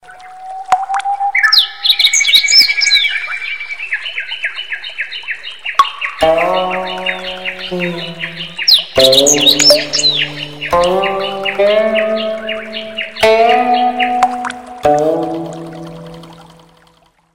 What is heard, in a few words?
Tweedle, ringtones; Coolpad